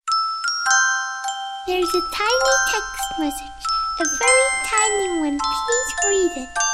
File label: Cute Sleepy Baby Sms